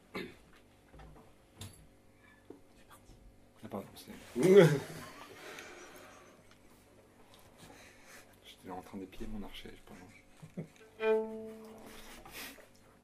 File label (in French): bonus track archet